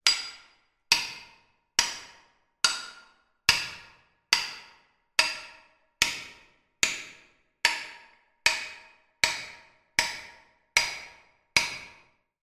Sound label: industrial metal hammer impacts on metal pipe med slight reverb